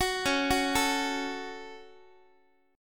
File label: Db Suspended 4th Sharp 5th, Guitar chord; arpeggiated; JGuitar